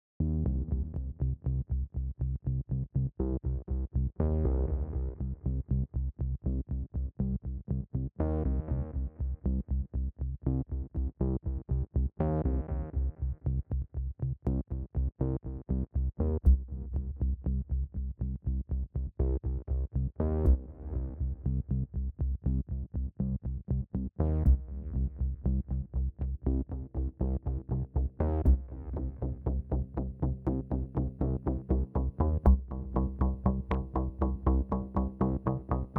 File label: Bass